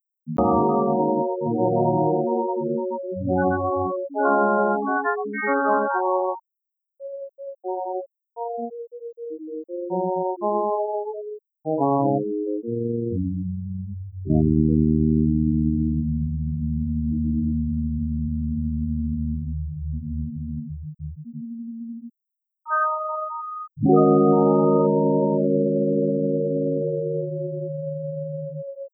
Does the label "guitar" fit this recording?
Yes